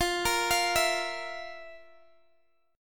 F Major 7th Suspended 4th, Guitar, DADGAD

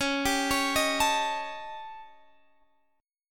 Eb 7th Flat 5th Db, guitar

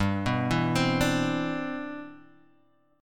C Major 9th, G, guitar chord

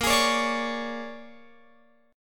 Bb 7th Sharp 9th Bb, Guitar Open F; Bb7#9 strummed; x,17,20,20,17,20

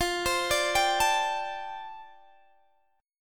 F 6th Add 9th F Guitar Open G

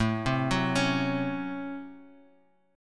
C sharp Major 7th Suspended 4th Sharp 5th, A; arpeggiated; {5 3 4 6 X X}

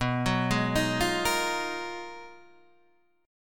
Ab Minor 13th, B, guitar chord

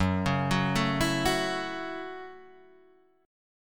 A 6th, F sharp, chord

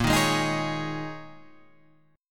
Ab Suspended 2nd Suspended 4th Bb

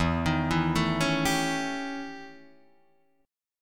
E Minor Major 13th E Guitar DADGAD; EmM13; arpeggiated

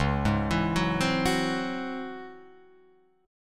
Db Minor Major 11th Db down Guitar Open F; arpeggiated

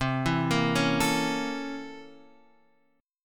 C 13th Flat 9th C

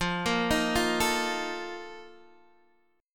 Bb Major 7th Suspended 4th, F, chord

